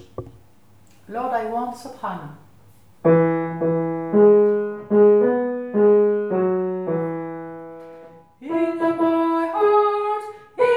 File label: Lord I want Soprano